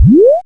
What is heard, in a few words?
jump; ShatteredSword; demo assets